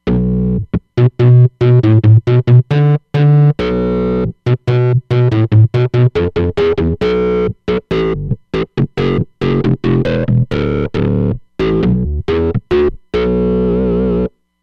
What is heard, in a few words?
Roland Fantom XR; Underneath